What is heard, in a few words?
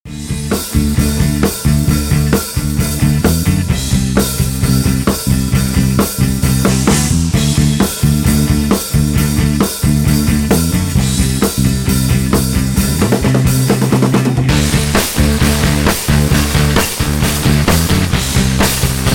Master Limiter Color even; Bettermaker